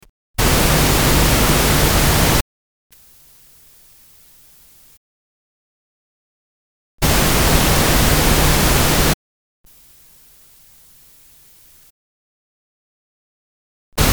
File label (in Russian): Rozovyj shum poocheredno v faze i protivofaze 0db